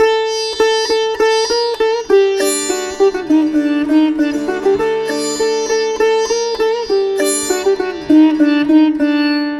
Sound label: Sitar D MIN 100; Indian sitar; Indian Sitar Vol. 2